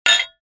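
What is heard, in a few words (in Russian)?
три стакана с виски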